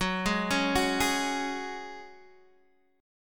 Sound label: F sharp Suspended 2nd, Guitar, Open D; x,9,6,7,9,6; down